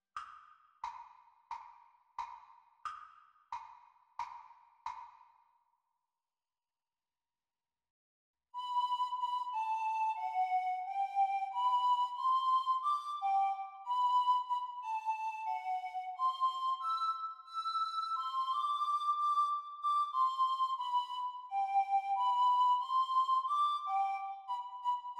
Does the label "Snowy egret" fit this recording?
No